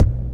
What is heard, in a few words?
kick, DnB Breakz Kit; Breaks N Beats Sound Kit